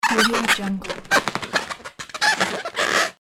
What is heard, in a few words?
making balloon animal; floraphonic